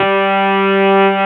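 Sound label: Wurlitzer G2 - R; USB Soundscan vol.09; AKAI; Keyboards Old School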